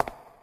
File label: cave grounds step; Golden Crayon Sounds